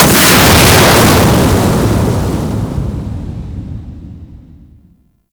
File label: Tunnel Fire Start; Ambience; Grineer Spy Vault; Warframe; Grineer Galleon